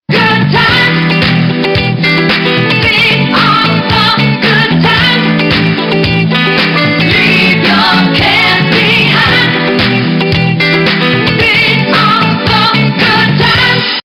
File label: SATB